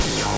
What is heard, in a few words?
obnoxious kick